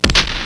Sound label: cannon fire